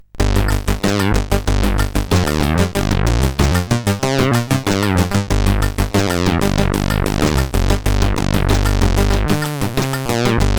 Funk, CSG8580R5

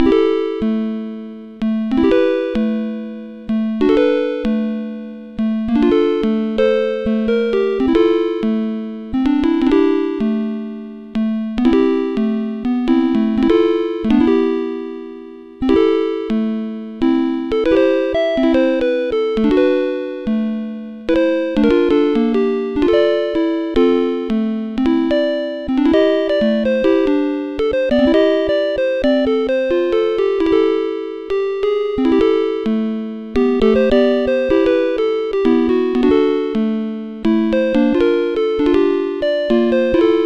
Broken Piano Roll live, sid CSG8580R5

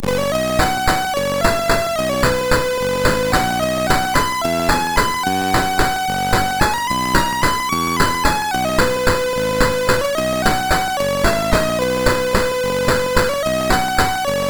sid MOS6581R4, GAMES; Aussie Games

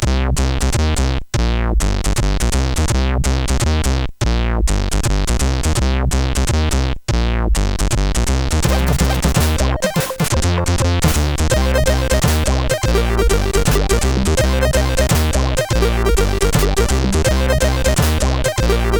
funk, CSG8580R5

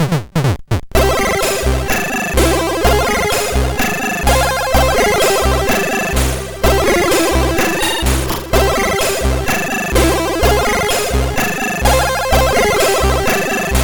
Music Samples, sid, MOS6581R2

